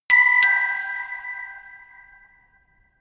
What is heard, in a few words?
notification glockenspiel creepy third down